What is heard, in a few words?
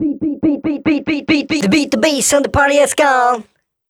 Vocals; Vengeance Samples; Special Sounds